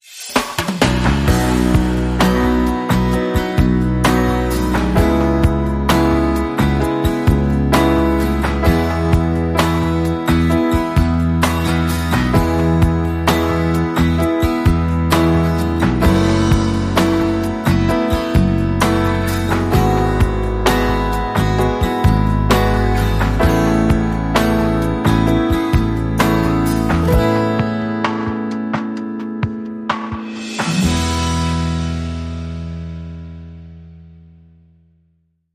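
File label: Wobble Bass Short Rhythm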